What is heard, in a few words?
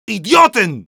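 jeers, Spanish; Medic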